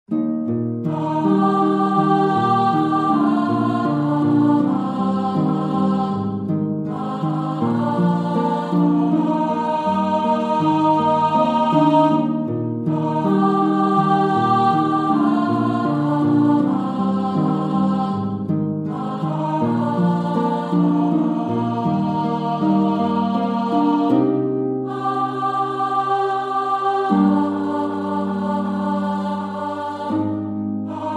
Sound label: Alto